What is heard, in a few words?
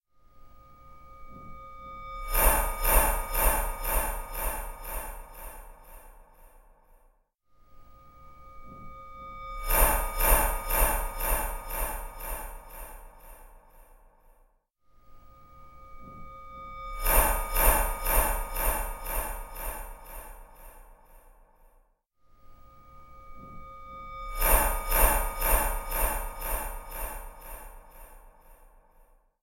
Horror Effects